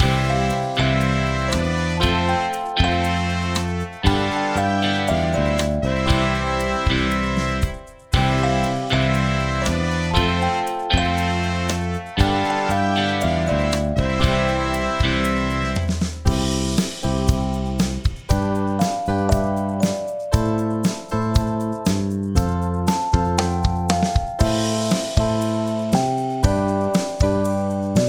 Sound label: instrumental; Pesach 2026; Leaving for the Promised Land